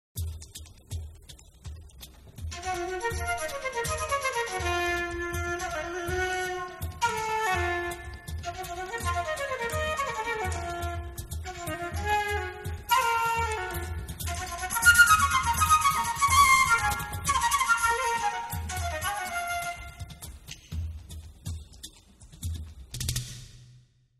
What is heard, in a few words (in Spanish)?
Buleria solo primero uno